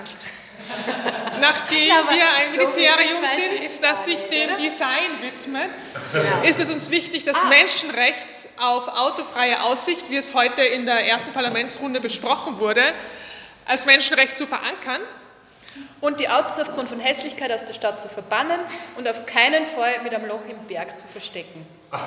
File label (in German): Lustvoll Klatschen